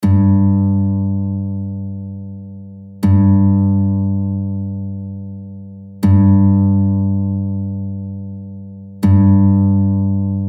Bandurka G-strij 5 G2

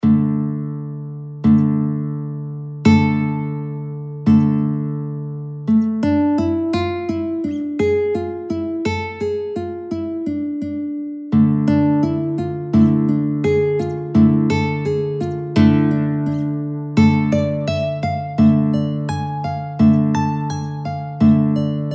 kobza; bandurka; VKushpet; Mamajivska kobza

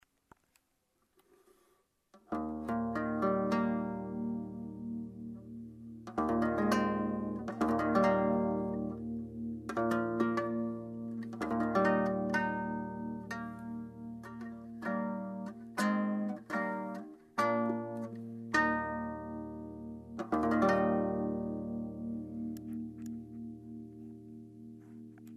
Akordy Bandurka; Mamaivska kobza

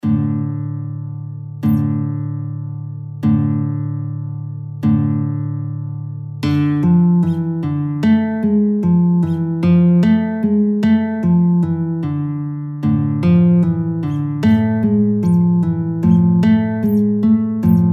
kobza; bandurka; VKushpet; Mamajivska kobza

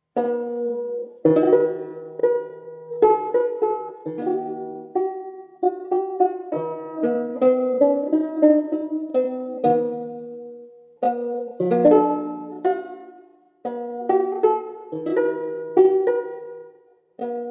Bandurka Banjolele; Vechirnja pisnja; Eddy Finn EF-BU1

